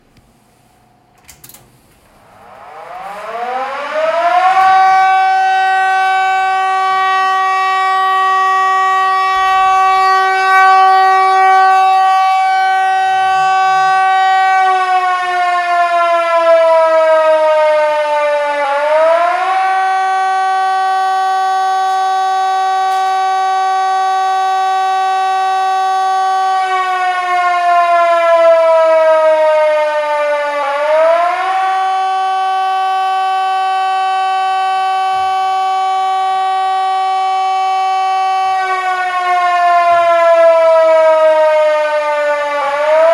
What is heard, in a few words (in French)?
risque majeur debut